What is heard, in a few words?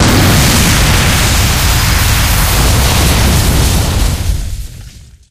bomb explode; video games; source engine; tf; mvm